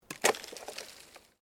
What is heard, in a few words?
SNOW FALLING FROM TREE BARK STEREO, Snow falling from trees in a mountain forest; Weather